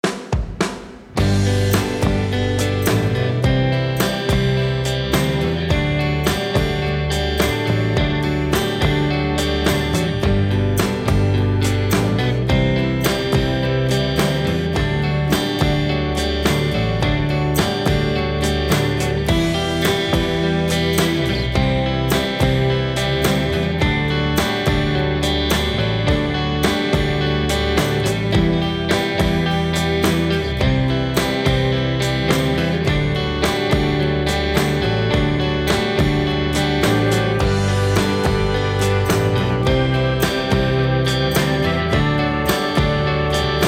Carnaby Love Song; Noisebiscuits

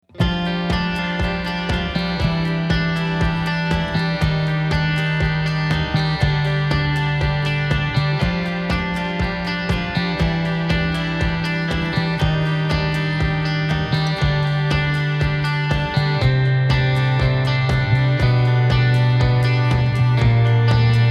Tightly Wound; Noisebiscuits

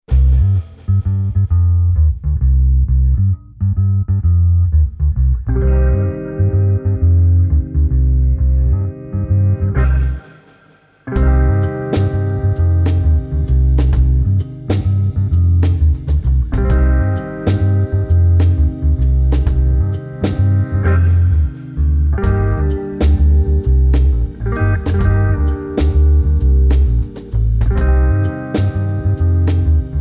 Jazz Bin; Noisebiscuits

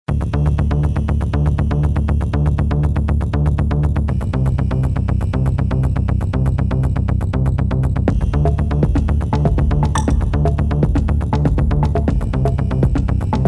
All in Code; Noisebiscuits

